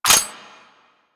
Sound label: Mech, Weapons; Kuva Hek; Warframe